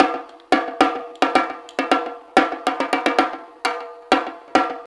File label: taiko; We Love Katamari Reroll + Royal Reverie